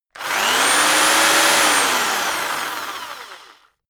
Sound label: rock drill rev medium long